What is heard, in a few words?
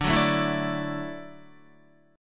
D-Major Add 9th-D-down-Guitar; strummed; JGuitar